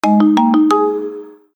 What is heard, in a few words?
notifications; BQ; Aquaris-E5